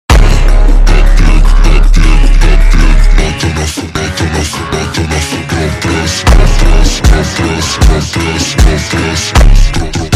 Phonk MONTAGEM Ultra Slowed

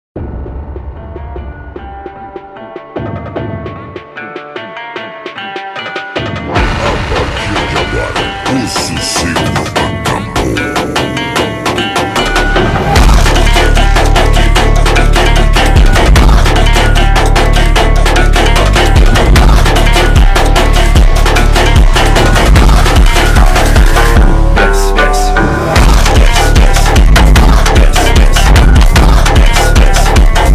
Phonk Funk

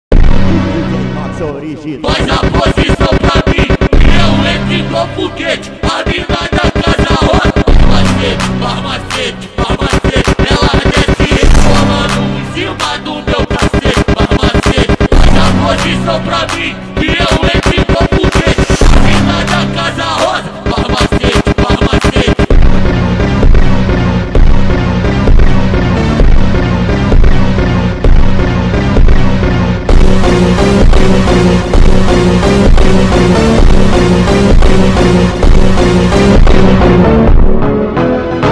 Phonk AUTOMOTIVO

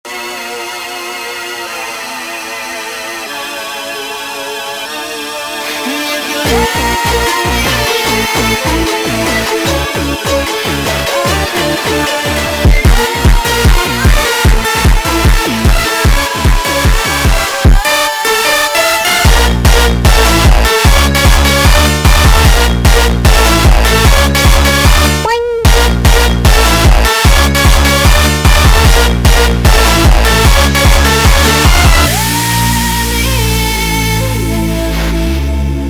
Phonk

